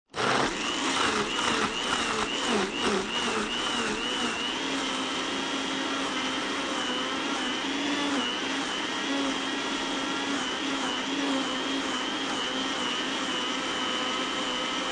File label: Household, Blender Turn On, Blend Smoothie, Turn Off; Appliance